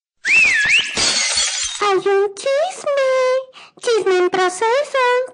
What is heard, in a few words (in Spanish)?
silbido